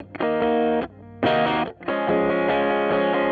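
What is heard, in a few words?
SM-57 near, Electric Guitar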